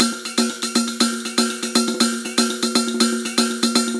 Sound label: Rhythm 120; Sonic Foundry; Hip-Hop Street Beats Construction Kit; Opium